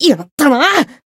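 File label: Voice damaged, Japanese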